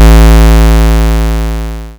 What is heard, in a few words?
Vvizard Throw